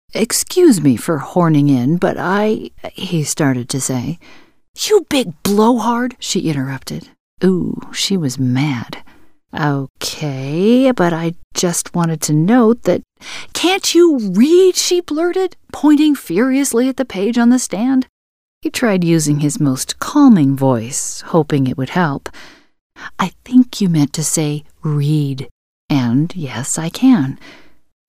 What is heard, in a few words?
Sax